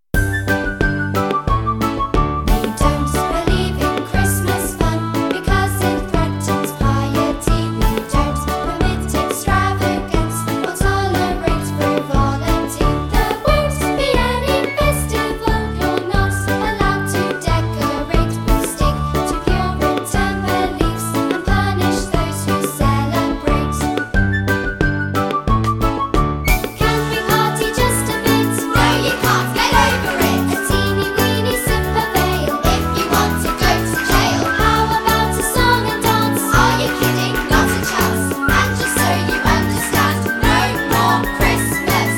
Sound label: No More Christmas with vocals